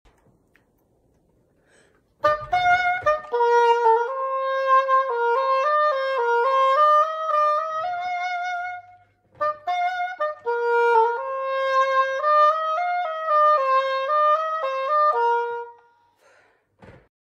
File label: Cor Anglais; کر آنگله